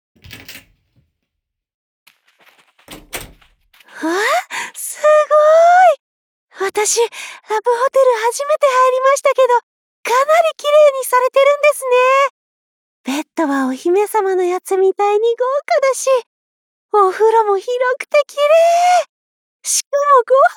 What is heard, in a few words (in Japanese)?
ディープキス・愛撫; 熱くて、優しくて、甘い。